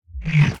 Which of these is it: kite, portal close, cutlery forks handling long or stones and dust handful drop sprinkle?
portal close